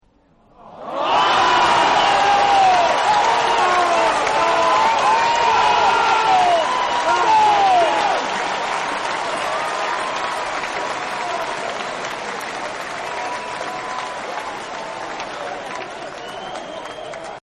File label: Cheers Huge Distorted; Crowds; Partners In Rhyme